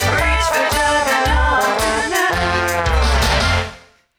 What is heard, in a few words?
Sting; Zombie Perksacola; Jugganog